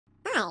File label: Hi Wave Sound Effect; Roblox